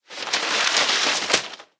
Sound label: Pour Paper; blue archive